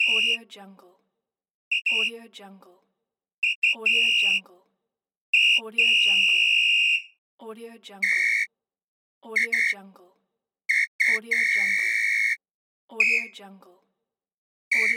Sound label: whistle